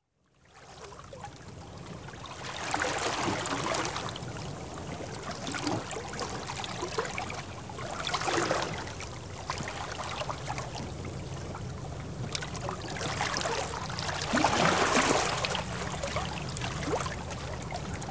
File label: Water Filling Tide Pools; Dr. Sound Effects; 210 Nature Sounds - 20 Hours of Relaxing Natural Ambiences for Meditation and Sleep